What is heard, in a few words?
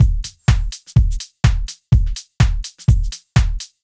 DEEPHOUSE BEATS 125